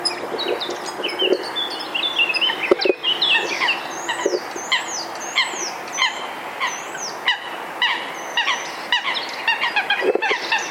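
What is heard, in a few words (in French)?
fauvette tete noire etourneau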